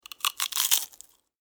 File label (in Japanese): お菓子をかじる